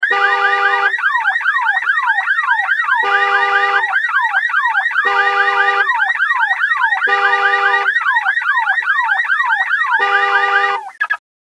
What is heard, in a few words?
signal